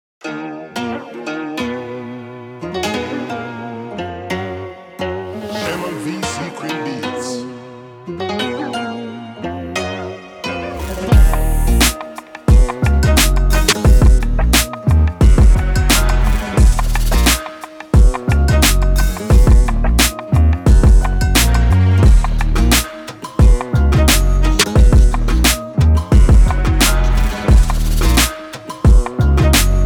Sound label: Hip-Hop